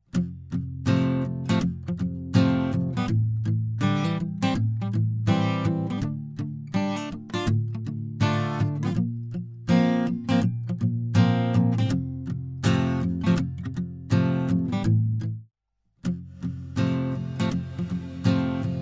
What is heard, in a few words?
guitar